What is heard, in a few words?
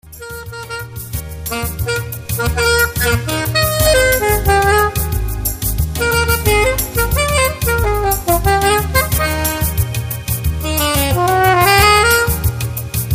sax